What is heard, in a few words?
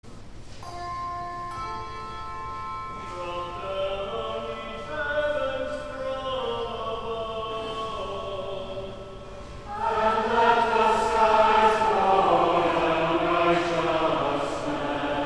Antiphon and Prose, plainsong; St. John's Cathedral, Jacksonville Florida; 'Ye are my witnesses, saith the Lord'